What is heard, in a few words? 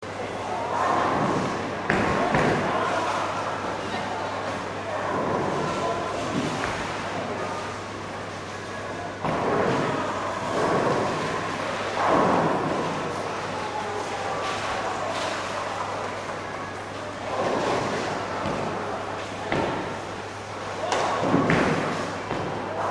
bowling